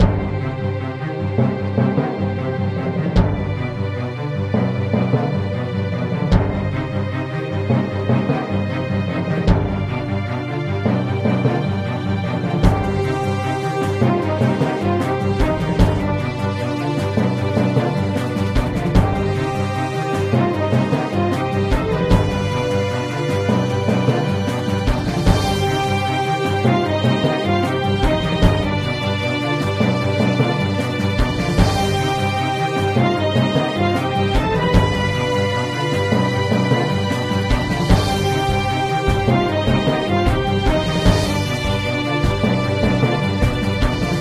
Boss Music

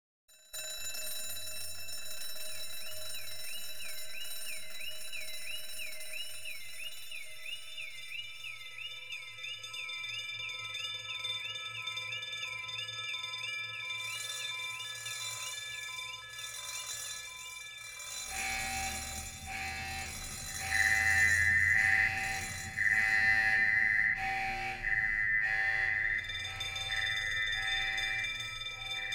alarm background; teardown ps5 windows xbox series xs gamerip 2022

